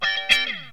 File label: FUNK; Rythmes et Intros 4